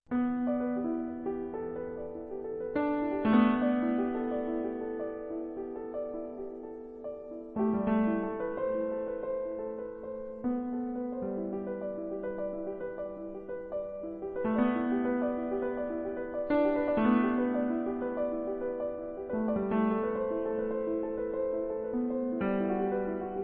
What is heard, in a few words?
improvisation